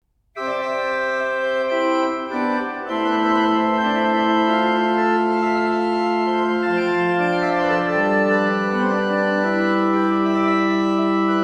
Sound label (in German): Ouverture Suite C Dur, Orgel; Gottlieb Muffat; orgelweihe und orgelkonzerte in der malteserkirche